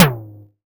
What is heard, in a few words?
Synth Tom Lo Mid, Percussion Hits